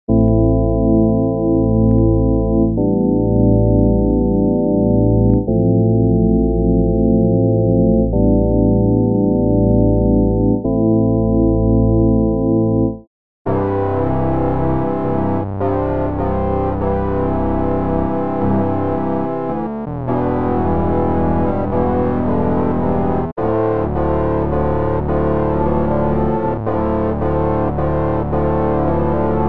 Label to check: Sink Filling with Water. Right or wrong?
Wrong